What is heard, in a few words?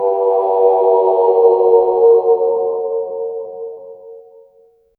tunneled echo rumble, darkness